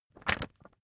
magazine skim

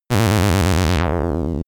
synthetic energy drop

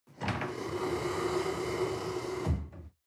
table slide on floor

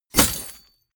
chainmail impact

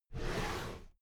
drawer cabinet paper open regular halfway

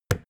wood hit hand

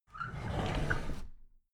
drawer big squeak open regular

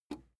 plastic button small simple release

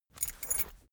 key ring small trousers pocket put

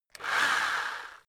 rock drill rev reverse short

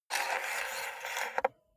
cassette player antenna retract medium

